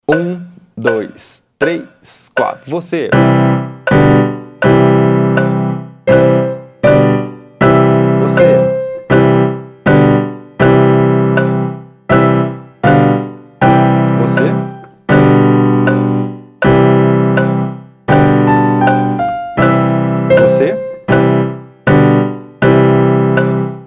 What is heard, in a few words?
Frere Jacques 80 BPM; Modulo 1 Aula 15